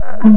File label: PERC FX, Big Beat; Big Beat Samples & More; AKAI